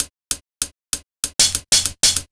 97 DRMS, Underground Hip Hop; USB Soundscan vol.02; AKAI

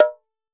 Tabla Dung; Roland BK-5; Percussion

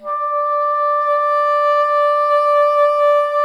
WND BSN, Bassoon

